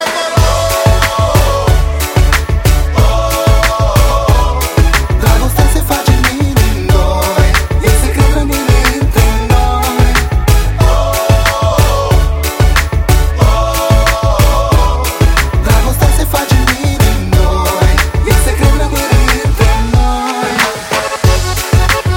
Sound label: romanian ringtone